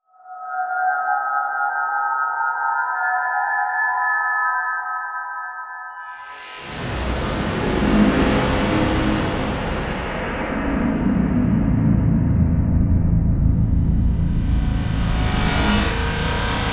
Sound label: Imaging Electronic Whoosh Digital Resonant Whine Reverse; Noise; Production Element Title Imaging